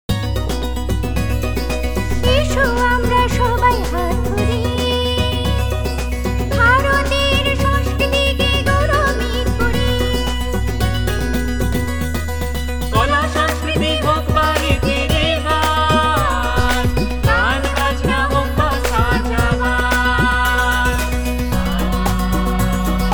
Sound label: Bengali Jingle